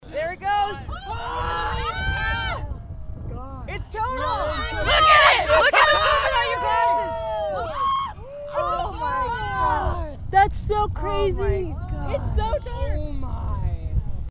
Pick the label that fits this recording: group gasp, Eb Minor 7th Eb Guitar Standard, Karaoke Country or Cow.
group gasp